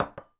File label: caffee saucer place